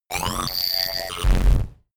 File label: rumble glitch short, sciene fiction